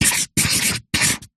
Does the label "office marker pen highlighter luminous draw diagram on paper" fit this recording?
Yes